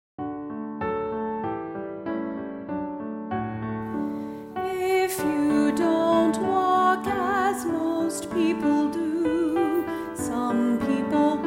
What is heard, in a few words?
singing